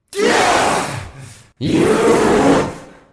stab; gasharpoon

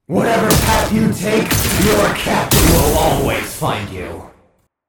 echo; gasharpoon

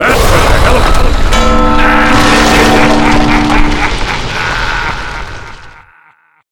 win; ff2; demonic wizard; liafail

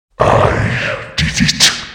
win; freak fortress 2; corrupted snow

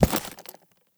step, footsteps; freak fortress 2; sniper ops; nighteye

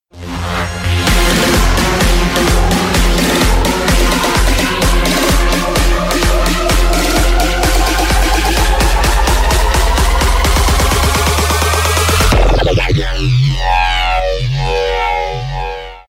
intro music; thaimemes; agent pink

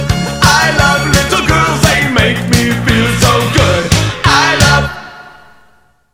outtro music; freak fortress 2; pedo bear

